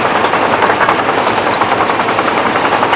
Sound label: tracks move, tank